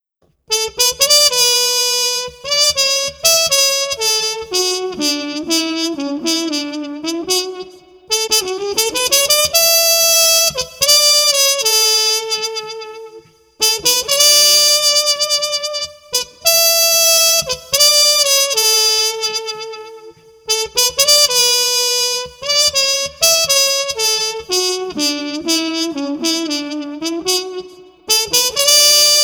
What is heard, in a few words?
Street Trumpet Muted 120